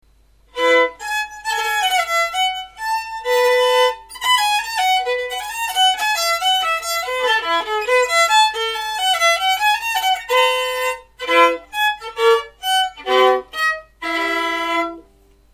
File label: gavotte; violin